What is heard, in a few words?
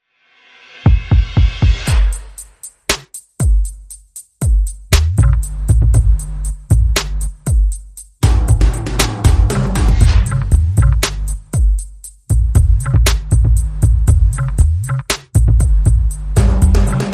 Perc and FX